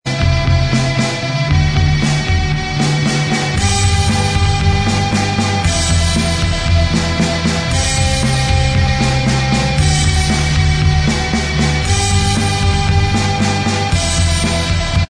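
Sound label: Inst Track, instrumental track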